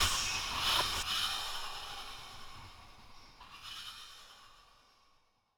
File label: waves